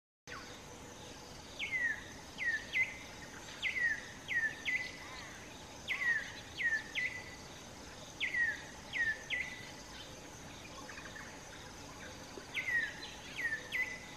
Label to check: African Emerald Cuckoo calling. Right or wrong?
Right